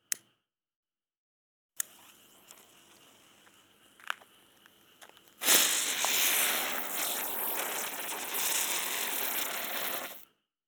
コマ花火; 夏実と縁側花火デート

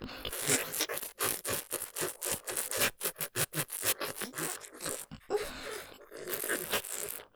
フェラ音マシマシ じゅぷじゅぷ系; ピストン絞り; おかずにスパイス２; 勢いマシマシ

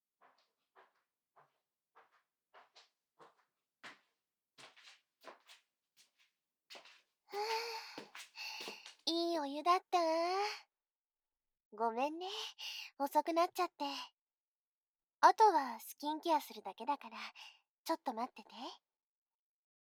お嫁さんの愛情耳舐め, ASMR

